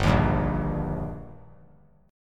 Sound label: G Minor Sharp 5th G, chord; strummed; JGuitar